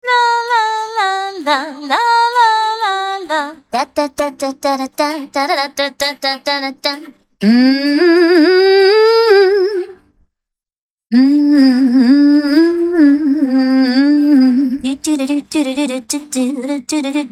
VOCALS

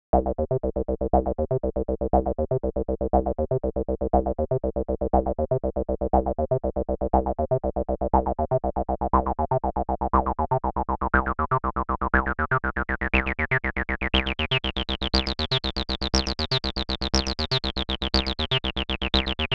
PHOSCYON 2 Square

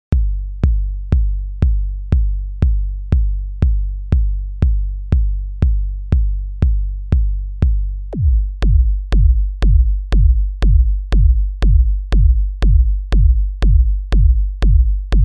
Bassdrum; DROPKICK

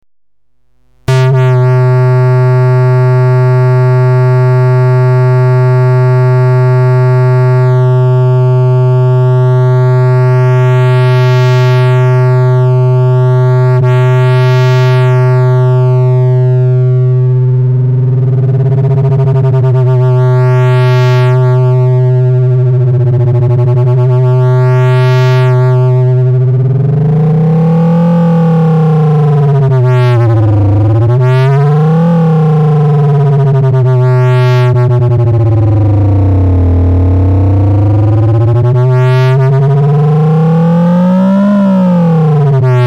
Resonator Saw

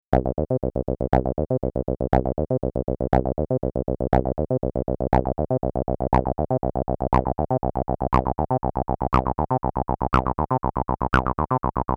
TD-3 Saw

